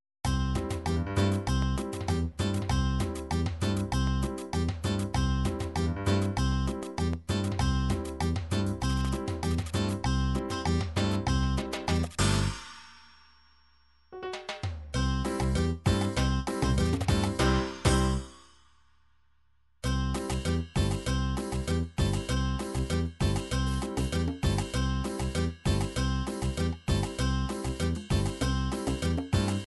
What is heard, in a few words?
latin cumbia trop, midi